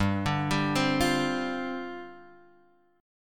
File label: G 7th Suspended 4th G down Guitar Half Step Down